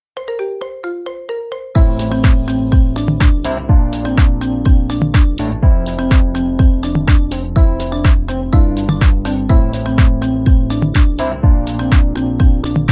marimba remix ringtone

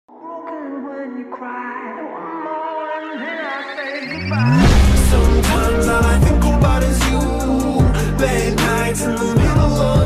mixed ringtone; android

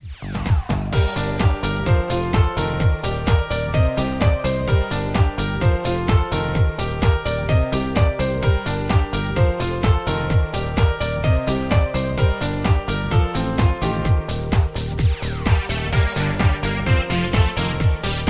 blue ringtone

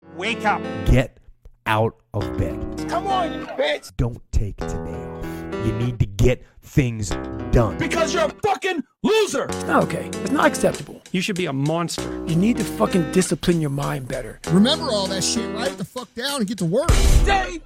alarm ringtone; android

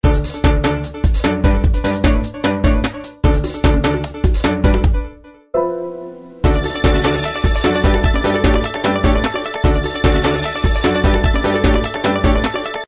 moto ringtone; motorola

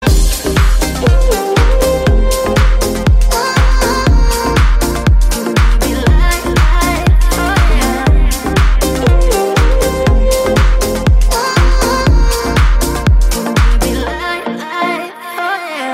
popular tune ringtone

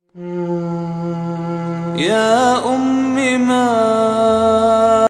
good islamic ringtone

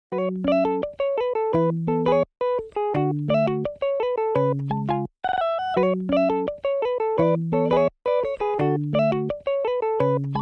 guitar ringtone; tiktok

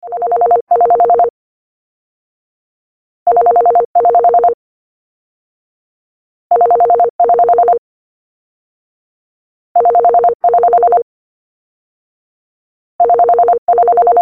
landline phone ring ringtone

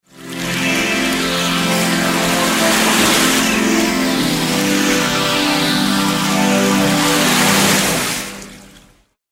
seaside sms ringtone

